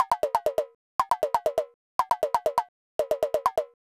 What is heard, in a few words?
rhythm 4 cells